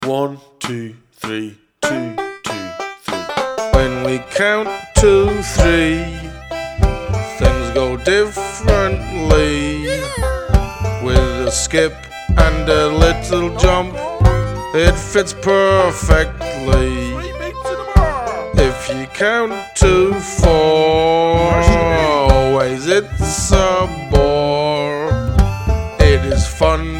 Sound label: With A Skip 3 beat bars